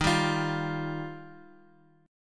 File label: D sharp Major Add 9th; D#add9 Chord {X X 1 0 X 1} strummed